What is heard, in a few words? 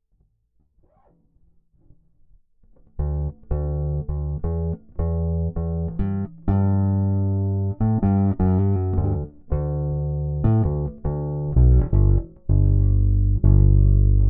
bass chorus